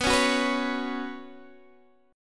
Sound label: B Suspended 2nd, Guitar, Open D